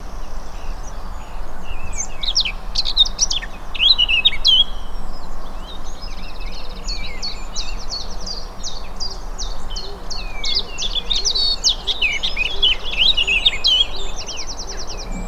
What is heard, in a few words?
Blackcap original; normalised